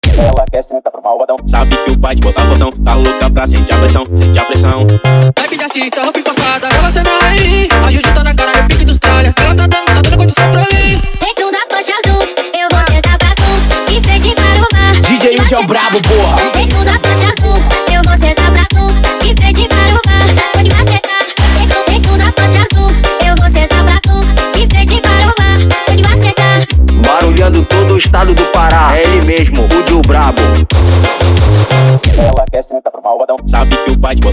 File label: REMIX